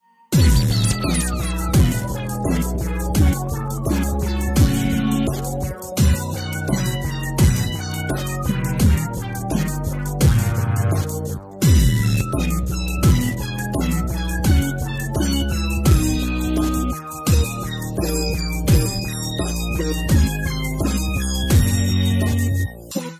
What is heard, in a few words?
Carnival Melody